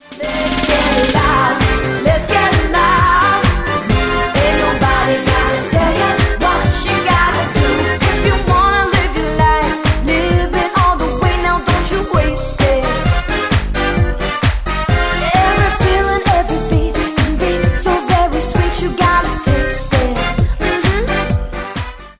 Corporate Party Cover Band

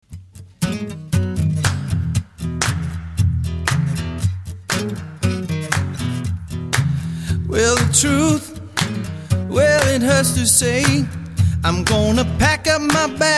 Solo Acoustic; Guitar Vocal

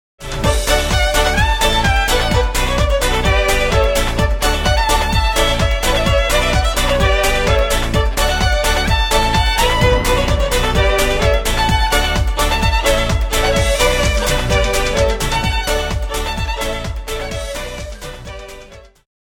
String

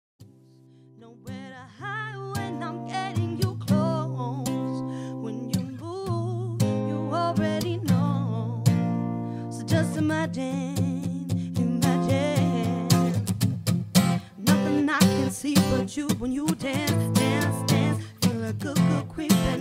Acoustic Duo Cover

